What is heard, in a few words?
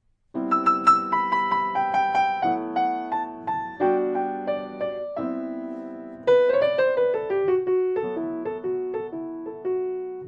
chill piano 90 Am9 Em9 Am9 G; KEYS ORGAN PIANO